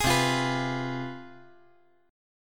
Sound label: C Major 7th Suspended 4th Sharp 5th, down